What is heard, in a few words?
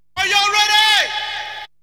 VOX SUNG; AMG Megabass REMIX! VOL-2